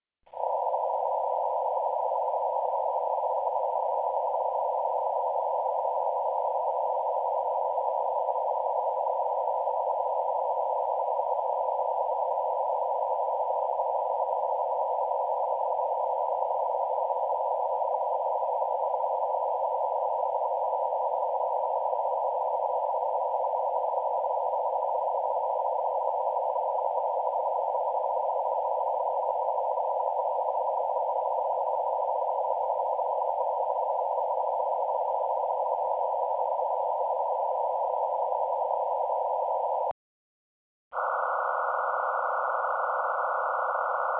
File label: MT63-1000; MT63 running 500Hz/1000Hz/2000Hz bandwidth with very short interleaver; MT63 MULTITONE PSK MODEM